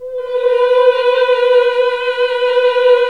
MMH B2, CLASSIC VOX

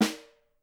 SN M R, Percussion; Miroslav Vitous - Percussion & Harp

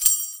SHAKER, INDIAN PERC

